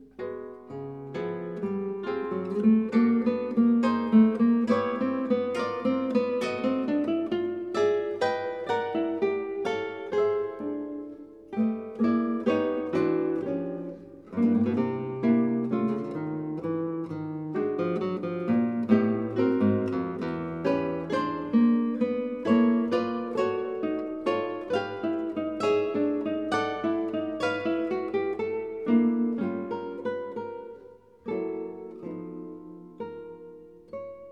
Guitar Quartet Aria

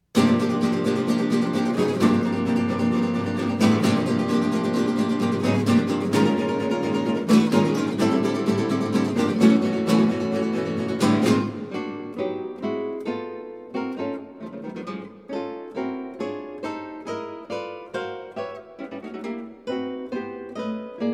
Guitar Quartet Agitation

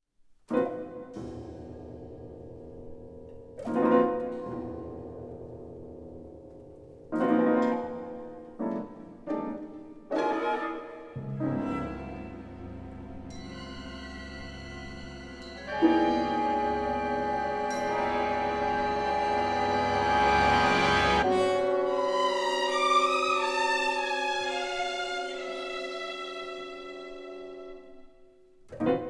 Orchestra From Source Evolving

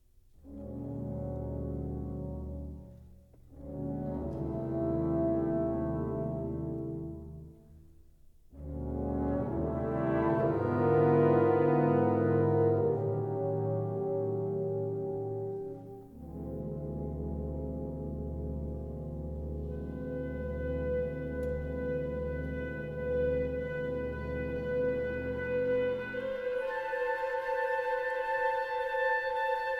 Symphony Band; Sounds Shapes Symbols; 2nd Movement

